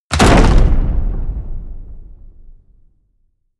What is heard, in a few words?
hit wood, hits impacts